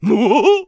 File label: clown throw; Peeps Clowns